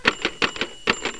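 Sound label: typewriter; vrainbow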